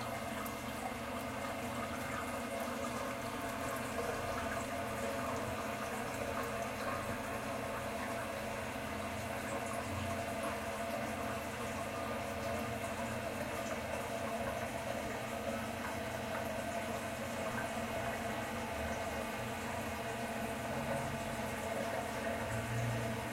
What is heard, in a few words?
toilet; Golden Crayon Sounds